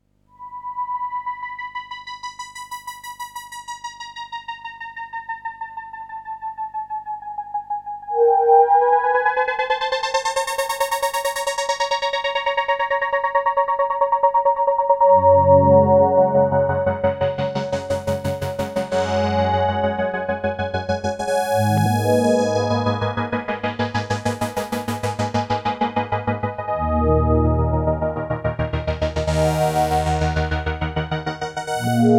bit99 vc LFO

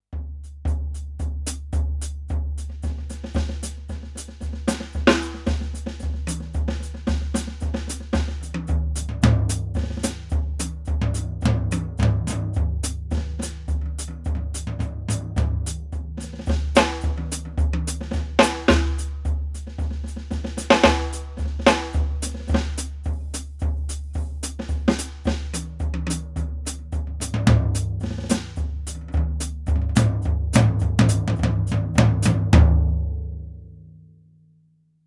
Legacy Jazz

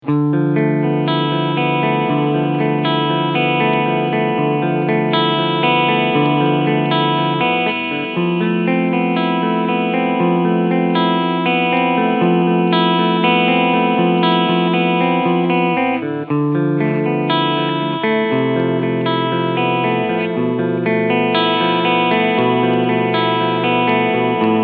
Gibson Les Paul Standard Clean Sound beide PUs

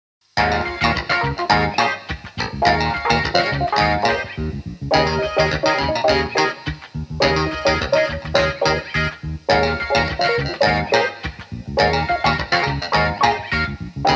Hohner Clavinet D6 Clavinet Guitar Bass

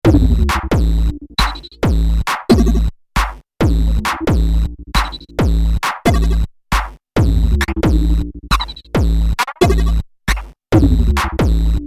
electro

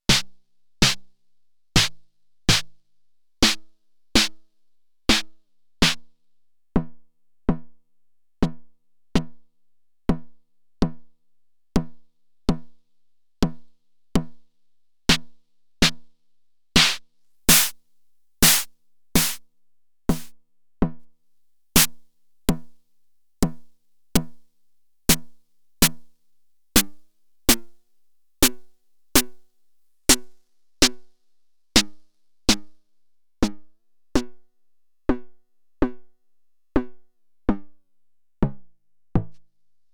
SNARE General

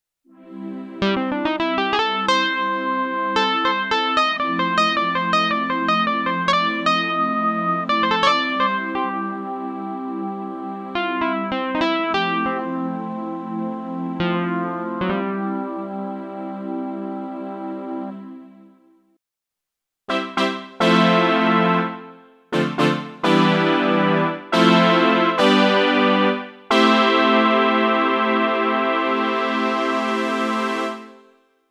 Synth Pads

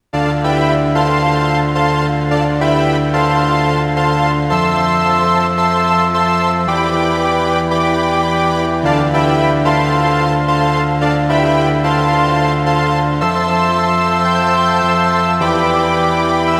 Echo Fix EF X3 Stereo Echo Chorus Hall

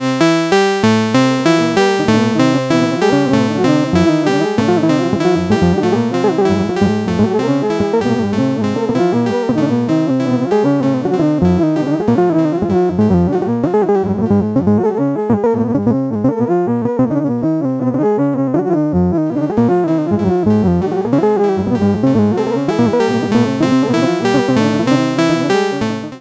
Tape Speed Linked Robot stepped Tri; Bastl Thyme

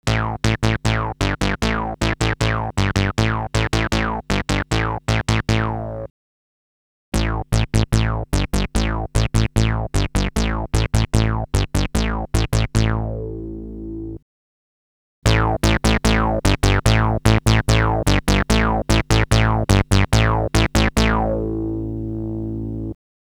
Bass

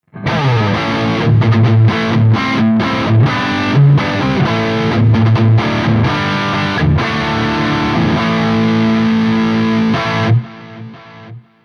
L7V TS Lead Heavy Riff

